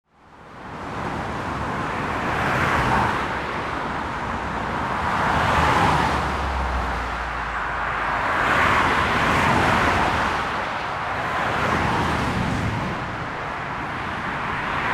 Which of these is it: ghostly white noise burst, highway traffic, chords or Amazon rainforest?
highway traffic